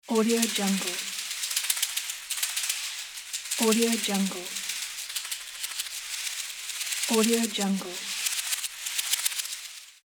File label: african shaman shaker